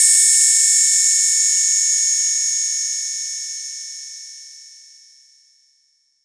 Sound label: vermona drm1 mk3 hh